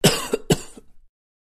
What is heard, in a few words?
dry cough; soundbible